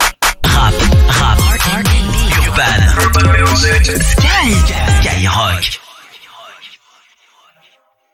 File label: JINGLE RAP RNB; SKYROCK